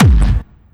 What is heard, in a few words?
Bassdrums Hard Kicks; Vengeance Samples